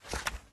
open flip; UI; Minecraft

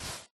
sand, dig; Minecraft

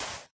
sand, dig; Minecraft

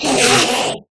scream, enderman; mob; Minecraft

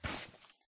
walk, turtle; mob; Minecraft

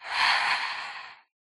idle, phantom; mob; Minecraft

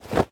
equip leather, armor; Minecraft

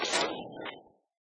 creeper death; mob; Minecraft

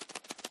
fly, parrot; Minecraft

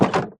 open, fence gate; Minecraft; block

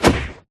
knockback, player attack; Minecraft

